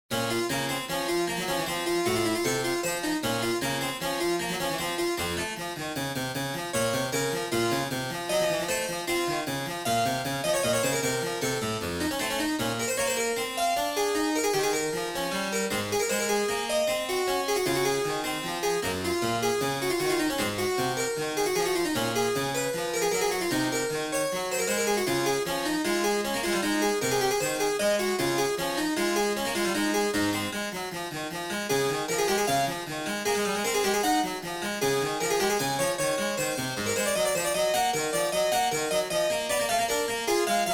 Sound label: Werckmeister III harpsichord; Bach; WTC 2-24